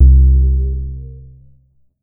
RAGGA, Bass Analog & Synth; DREADLOCK; USB Soundscan vol.12; AKAI

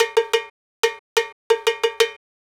Cow Bell, Latin Percussion

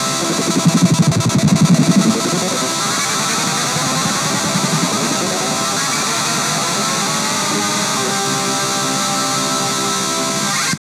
TAP HOUND, Synthy Tonal; Distored Reality

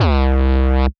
C Bass, DnB One Shots

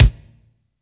KICK, AMBIENCE KICK

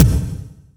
80s Drum Kick; Yamaha PSR E305

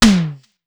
Simmons SDS2000; SDS2000 Factory4

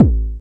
hard base; Q Up Arts; Dream Experience

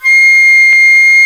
flute, C flute

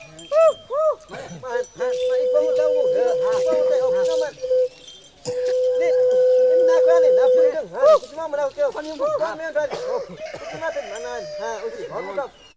XINGU, ETHNIC; AMG; Global Trance Mission VOL-2

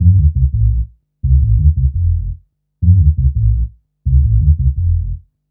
Hum Bass; Fluid Dynamics

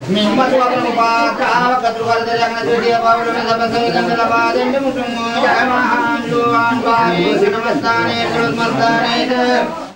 VOC, India; Best Service; Ethnic Journey Sound Cube

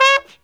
TRUMPET, BRASS

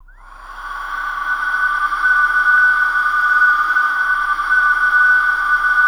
OVERTONICS, VOCAL WIND; ILIO; Vocal Planet; Jazz & FX

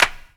clap, hip; Best Service; Dance Mega Drums

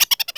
Evil Laugh; George Foreman's KO Boxing

